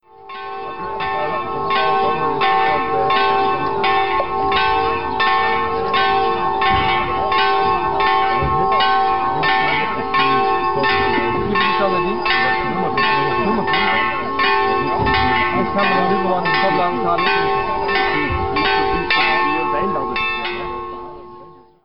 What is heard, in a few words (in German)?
bangs glocken